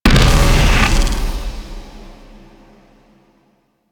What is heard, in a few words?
Spectre Spawn In; Warframe; Warframes